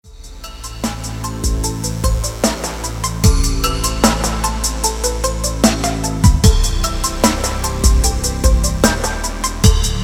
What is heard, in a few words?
Ambient Waves